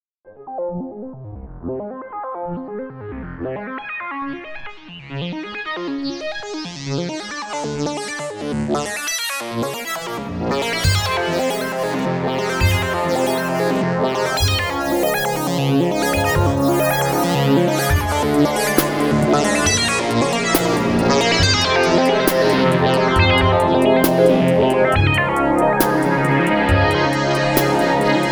harmonium

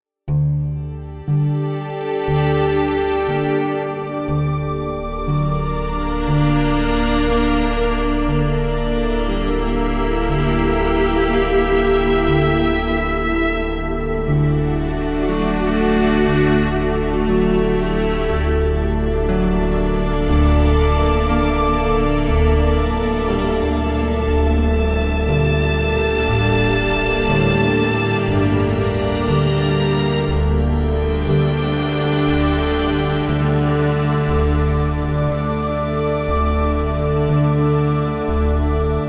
vertigoviola; CINEMATIQUE; RAW FRAGILITY

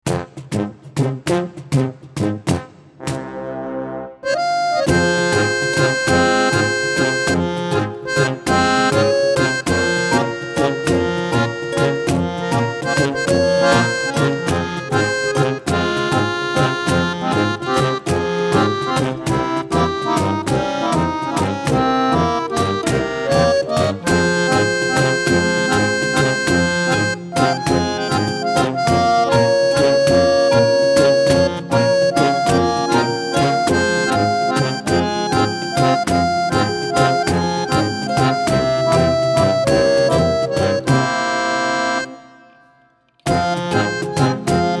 low banda volks brass

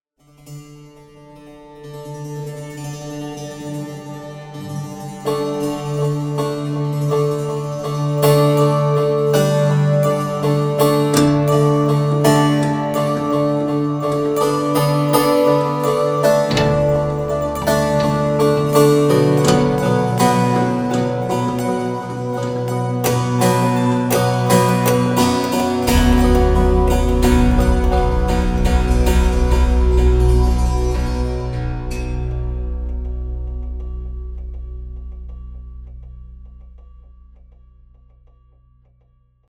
monochord

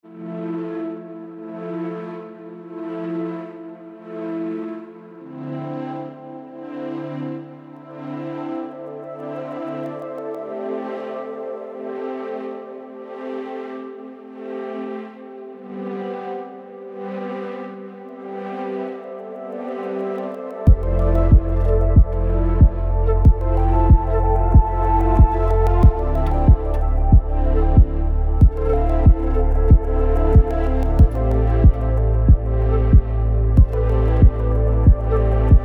vertigoflute; AIRY TEXTURES; Vertigo series; CINEMATIQUE

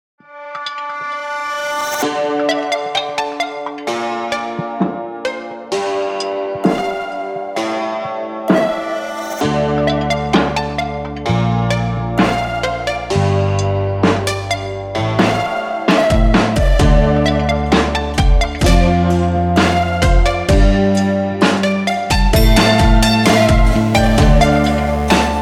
santur

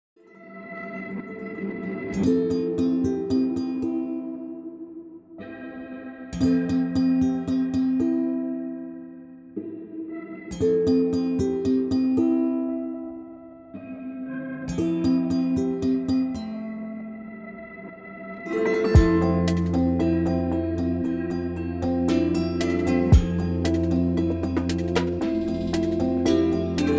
lapharp

